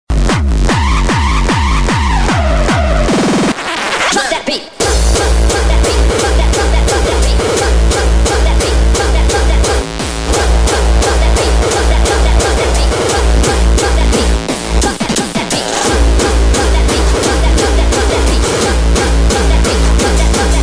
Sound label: Drop That Beat